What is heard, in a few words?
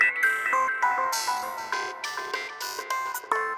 synth Glitch Rhythm 100bpm G